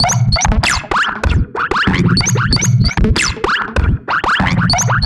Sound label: Perc 95; musicradar